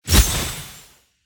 explode

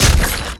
imp dual swords

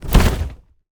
umbrella flap

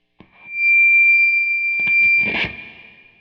mic test

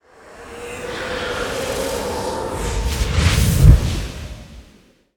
smoke converge

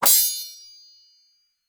sword tsing

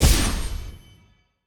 dual swords on

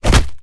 imp left punch

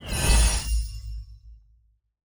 magic

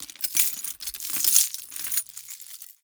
jewelry impact

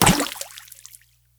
atk water cannon token

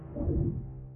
whoosh exit

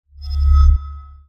ui upgrade

